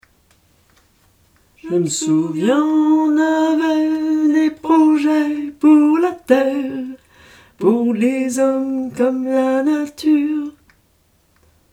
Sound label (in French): alto